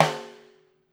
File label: STR SNARE, Brazil Drum Perc; E-MU Producer Series Vol. 7 – Old World Instruments